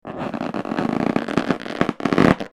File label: Stretch